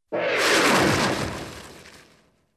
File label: Simulated lightning strike (left to right); Weather; The BBC Sound Effects Library